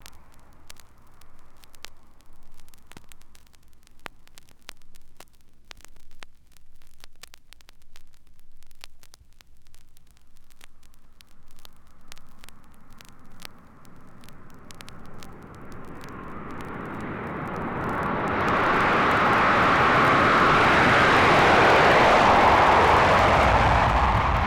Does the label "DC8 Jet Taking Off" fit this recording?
Yes